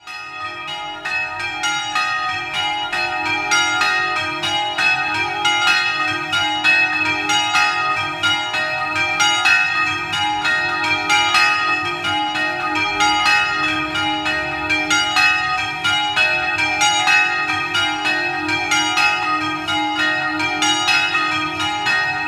Zoppola, campane; San Valentino

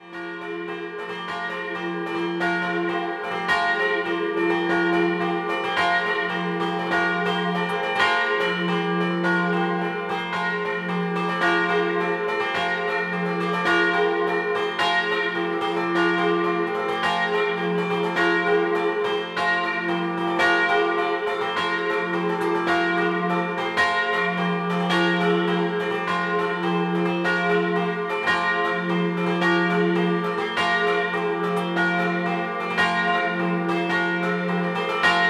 Vergnacco Scampanottata

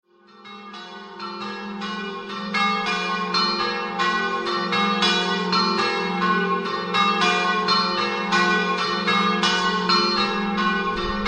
San ROCCO DI FORGARIA, campane

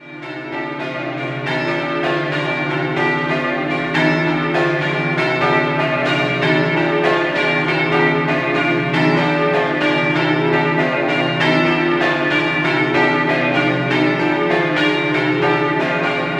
Porcia, campane

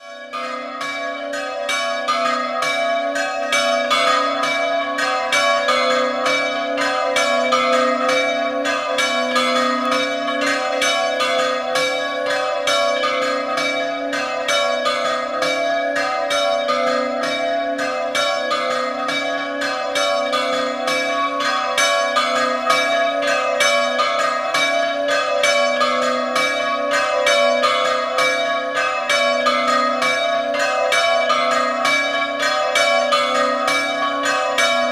Prata, campane; San Simone

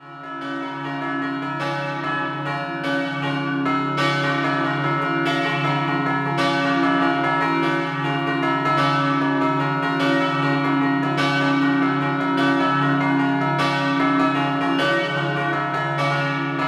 Rodeano Basso Scampanottata

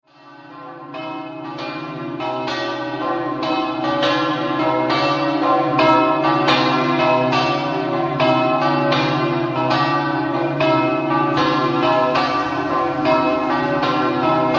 TERENZANO, campane

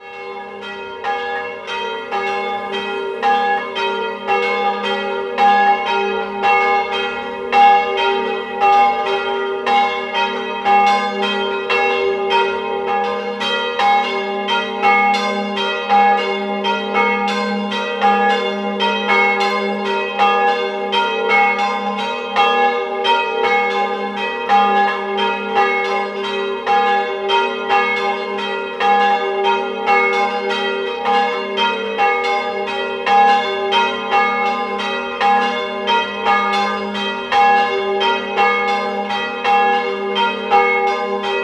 Sant Odorico Di Sacile, campane

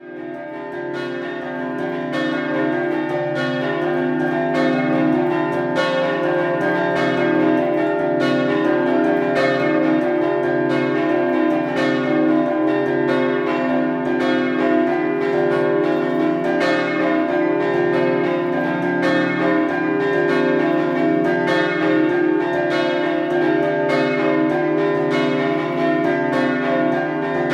Martignacco Scampanottata, campane

